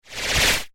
Flying Martian Monster, science fiction sound effect